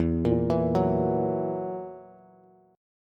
E Suspended 2nd Flat 5th E down Bass

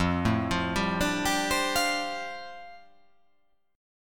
F 6th Add 9th F down, chord

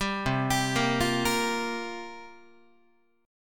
C 7th Suspended 4th, Guitar, chord; C7sus4 arpeggiated

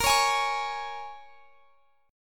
A sharp Minor 7th, A sharp, down, Guitar, Drop D

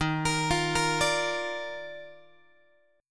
D sharp Major 7th Suspended 2nd, Guitar; arpeggiated; JGuitar

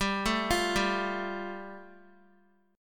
G 7th Suspended 2nd, Guitar; G7sus2 arpeggiated

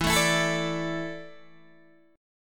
E 7th Suspended 4th, Guitar, DADGAD

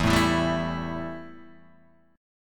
E Minor Double Flat 5th E Guitar Open D

